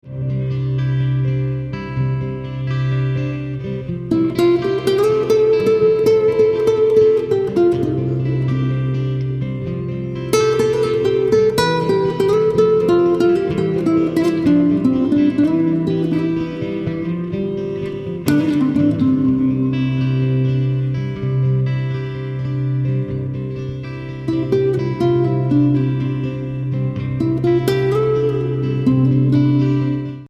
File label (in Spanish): guitarras